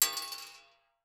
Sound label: Weapon Bullet Shell 3030 Drop On Metal; AdobeCC